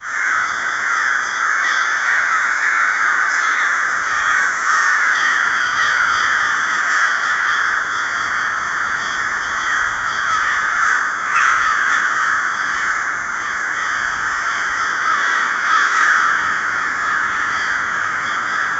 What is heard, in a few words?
crows rapidly making